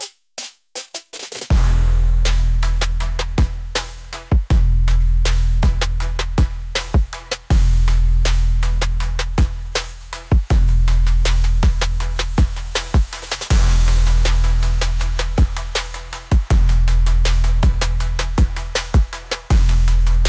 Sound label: anthem of the concrete jungle, bass music